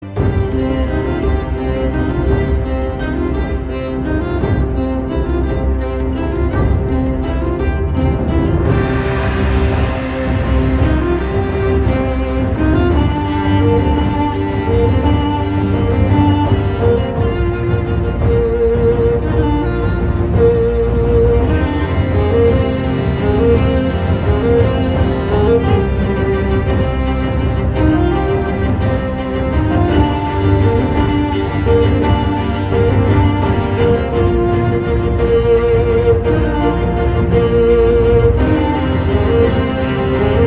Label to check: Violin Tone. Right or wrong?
Right